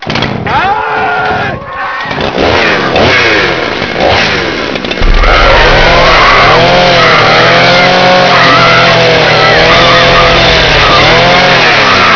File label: chainsaw; spawngirlsounds